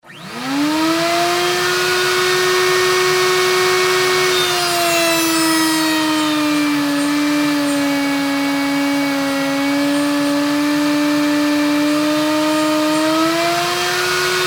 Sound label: Router Into Wood, 2X4; Hand Tools